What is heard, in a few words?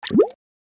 Drop Single Drip, Water Drop Single Drip; Dripping; Blastwave